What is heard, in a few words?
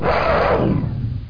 attack; PC Gamer IT CD 31; DINK